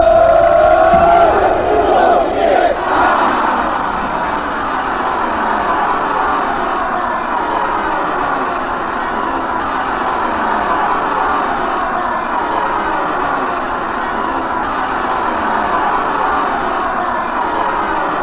woahhhhhhh as goalie prepares to kick, fanchants

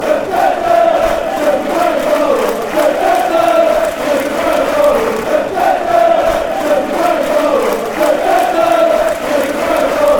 fanchants; super graham dorrans

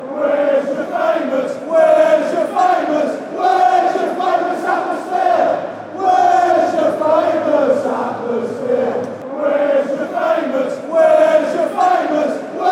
famous atmosphere england, fanchants

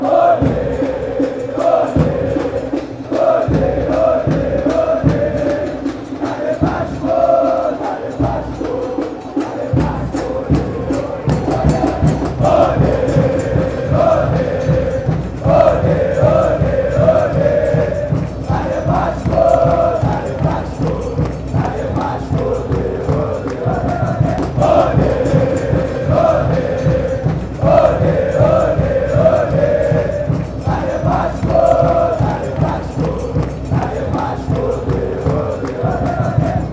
ole dale vasco fanchants

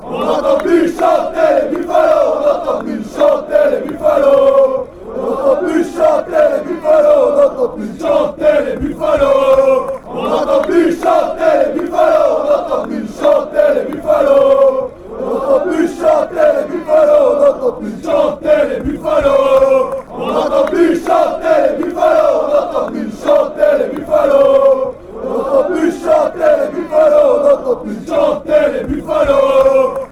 cant hear you, fanchants

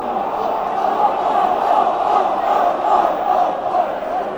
off off off, fanchants

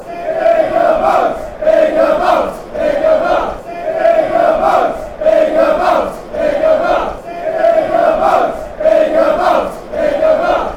york chant, fanchants

